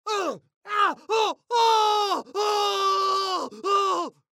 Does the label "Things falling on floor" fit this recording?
No